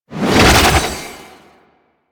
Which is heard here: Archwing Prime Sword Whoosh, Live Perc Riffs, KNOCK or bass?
Archwing Prime Sword Whoosh